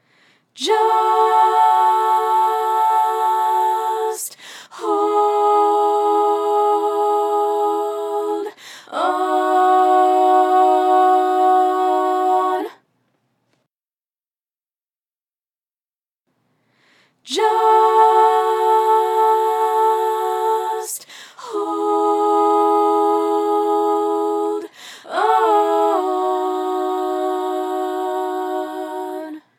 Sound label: Low Choir